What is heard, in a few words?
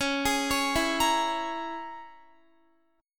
C sharp Minor 6th C sharp, Guitar; C#m6 arpeggiated